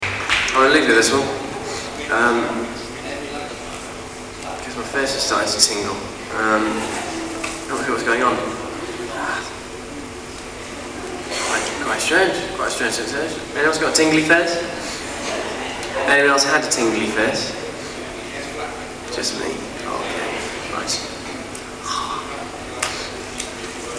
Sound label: clap, tingly; clapham